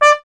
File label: TP D; Miroslav Vitous - Solo Instruments VOL-1; Trumpet